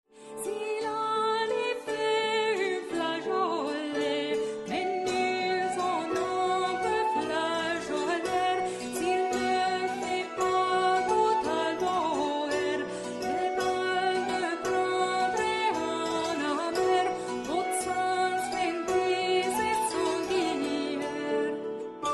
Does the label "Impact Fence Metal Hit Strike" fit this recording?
No